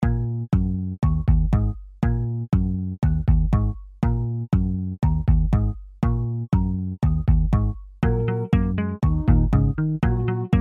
RPG Simple Shop 16-bit

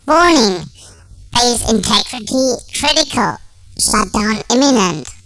Base Perc

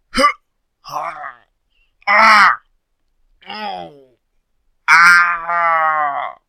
Man Hurt

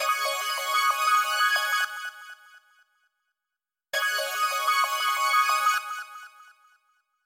SWINGING CHORD

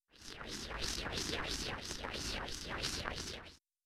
Magic Shield

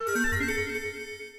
magical

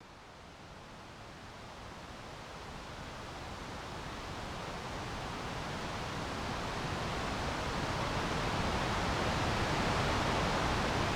Rainy

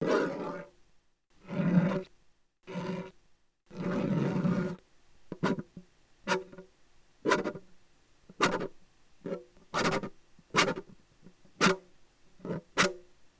bongo

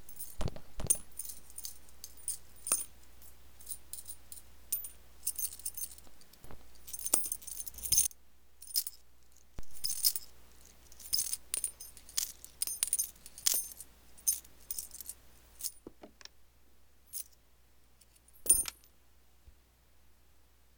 coin sounds

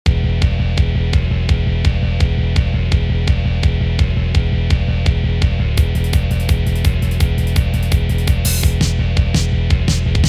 Battle RPG Theme; CleytonRX

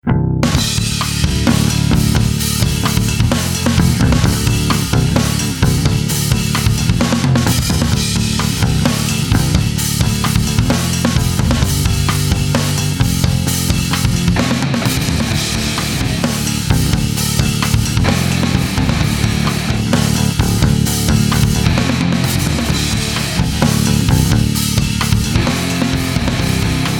Rock menu track (without pad)

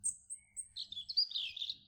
bird song

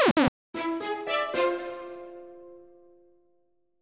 lose music

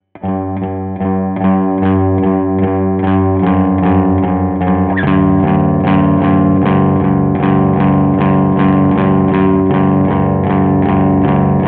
bass requiem

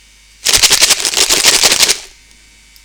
shaking cup long

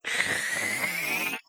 alien sucker

